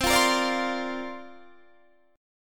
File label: C 9th Suspended 4th C down Guitar Open D